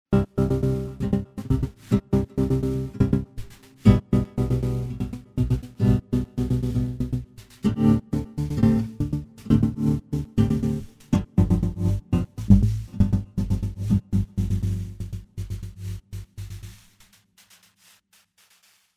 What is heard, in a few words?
Pad; EFX Motions